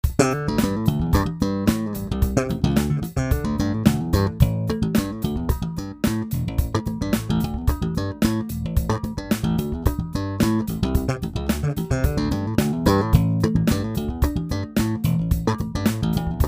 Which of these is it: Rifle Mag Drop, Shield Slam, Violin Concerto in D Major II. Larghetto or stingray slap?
stingray slap